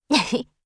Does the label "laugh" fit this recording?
Yes